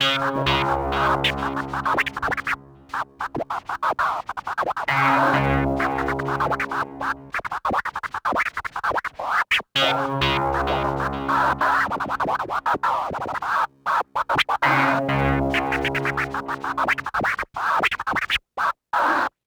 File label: synth; Matt Hoffman's Pro BMX 2; XBOX